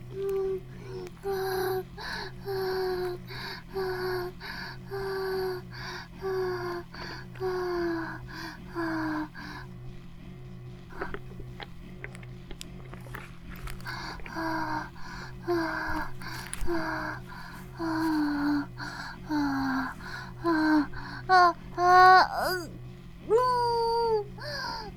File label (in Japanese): バイブ放置; イクたびにわけて